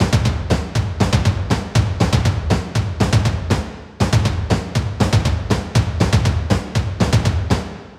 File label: Perc Stem Loop, 120, Mids; Drums; Perc Construction Kits; Ghosthack; Quantum Force 4; Enter The Void